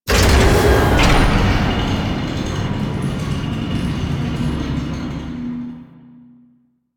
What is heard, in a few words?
connector start; ambience; Warframe; Entrati